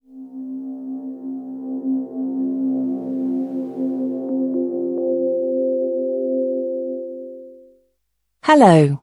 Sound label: Bootup; Alexa